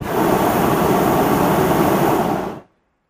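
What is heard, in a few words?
Hot Air Bllon Burn Cl, Hot Air Balloon Burner, Close; Medium Hard On, Off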